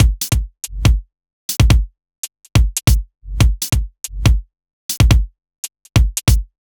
Garage Basic 141 bpm, Drums; Loops; Ableton Live 12 Lite; Core Library

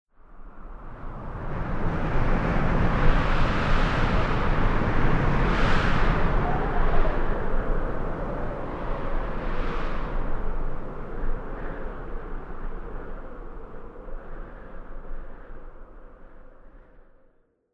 Wind 1shot Quad; Journey

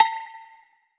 Cowbell Cheap; One Shots; Drums; Ableton Live 12 Lite; Core Library

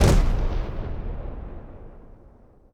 Impact Shot; One Shots; FX; Ableton Live 12 Lite; Core Library

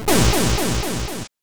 player defeated; Super Smash Land

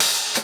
Hihat Open

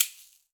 Shaker; Drums

